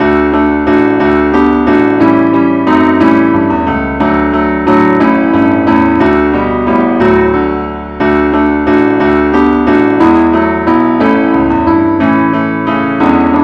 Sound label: keyboard only key d; angelvoices